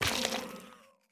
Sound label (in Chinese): 幽匿破坏声